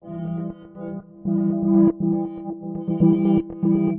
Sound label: Synth loop (120BPM); musicradar